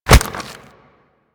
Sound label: Infested Bone Blade Scatter Shot Proj Impact; Warframe